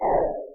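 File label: Piranha Fireball Spit; New Super Mario Bros. Wii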